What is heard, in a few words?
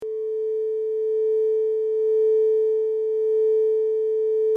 crystal singing bowl tone a; chakra6